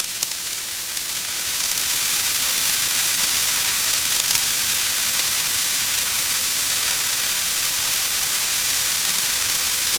ambience rain

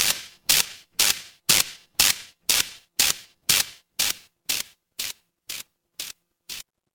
fire electric spark

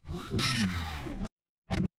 mechanical servo

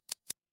mechanical equip

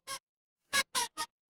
cartoon squeak

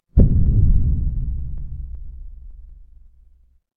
impact explosion

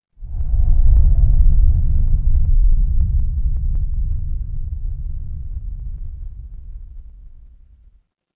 horror wind whisper

